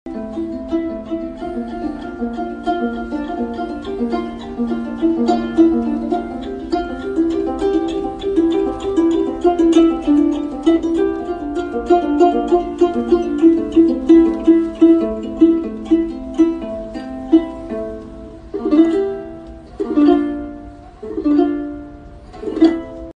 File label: Kithara